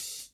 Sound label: short open hat sound C key, open high-hat tuned to the C note